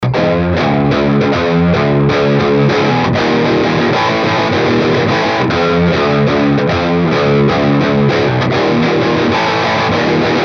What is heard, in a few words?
Matamp SM57 R121; Matamp King Street Major